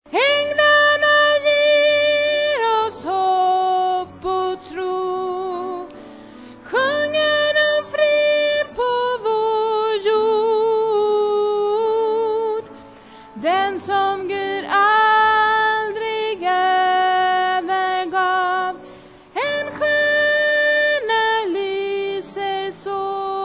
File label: tenor